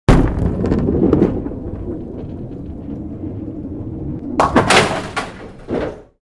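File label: Bowling Ball Down Lane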